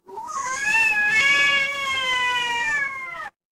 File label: animal cat meow